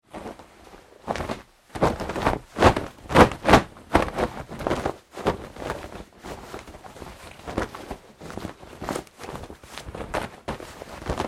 Foley Fight Moves Jeans Sequence, wrestling and struggling; Big Room Sound